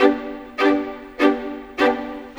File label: Viola, Violins, Orchestral, Rock Pop